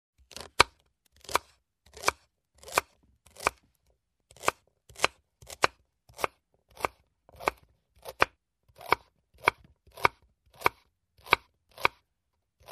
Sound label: Cutting vegetables